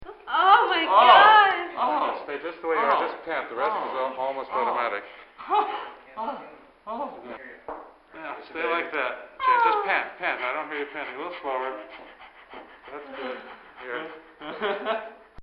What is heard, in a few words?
Childbirth, hospital; Hospitals; Backgrounds; Bobby Cole